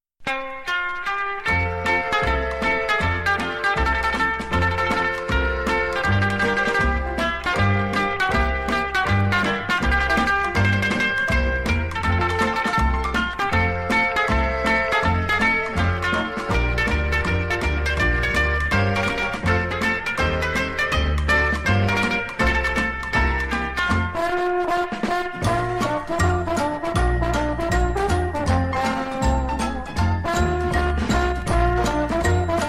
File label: Banjo Band play